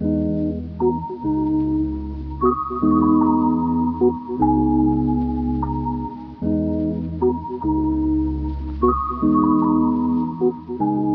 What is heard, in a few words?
Lofi Melody Loop, 75 BPM G# Min; Eternity